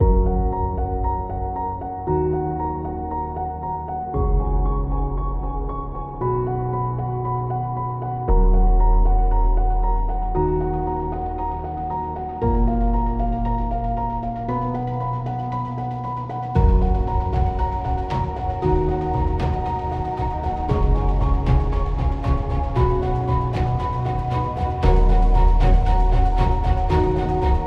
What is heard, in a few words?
Epic Inspiring, epic music; epic music vol 2